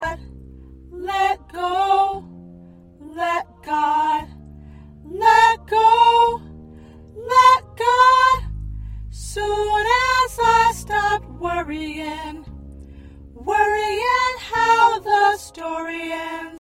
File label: Alto